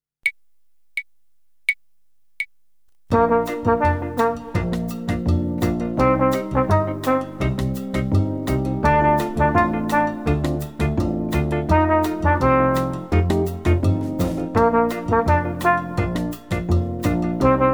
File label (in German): Echo Ubung